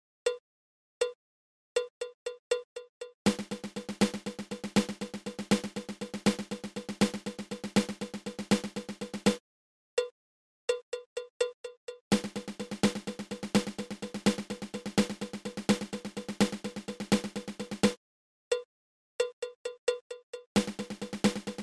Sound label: Double Paradiddle